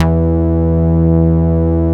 MOOG E3MF; 90S MOOG

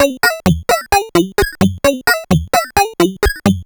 Sh101 Loop, 130BPM

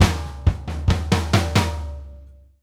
TOM SNARE, drum fills; Sampleheads - New York City Drumworks VOL-1

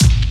BD, Dance Mega Drums, HIP

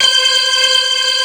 C5, Keyboards Old School; USB Soundscan vol.09; AKAI; BIG HAMM

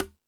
Latin Drums, E-MU Pro-Cussion

